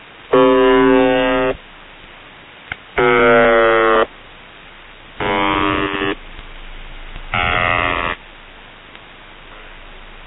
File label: generator power failure